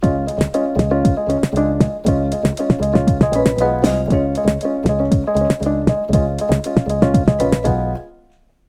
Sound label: square